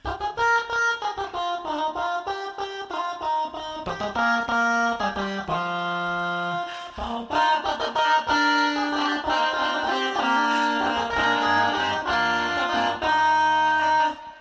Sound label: Success